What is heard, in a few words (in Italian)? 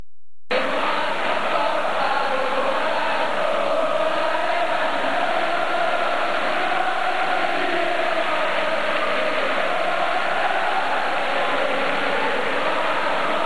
Forza Roma facci un gol